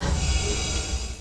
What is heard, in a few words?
Brake; seibu HSC